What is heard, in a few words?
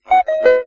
message new instant